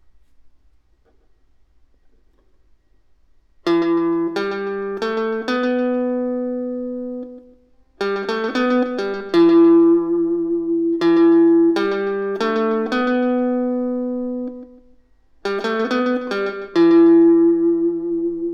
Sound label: Elect Guitar